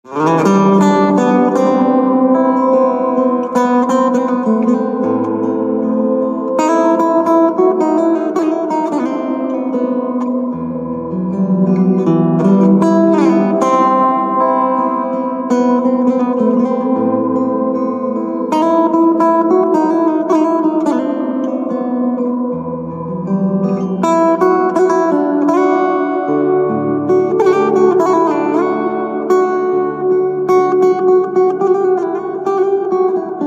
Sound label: Guitar, instrumental ringtone